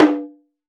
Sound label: Shimedaiko, Taiko, Percussion; Roland BK-5